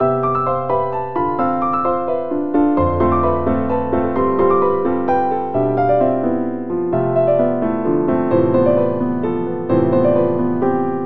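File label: classic funk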